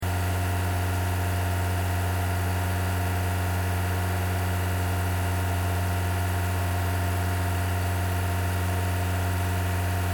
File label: CAR TOYOTA COROLLA IDLE 2000RPM ENGINE BAY LOOP STEREO, hatchback; 2009 Toyota Corolla hatchback